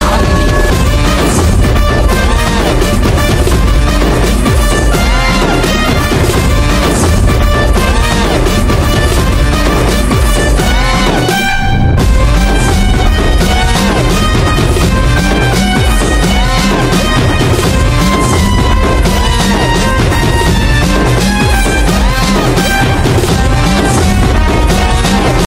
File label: bgm; Pushpa 2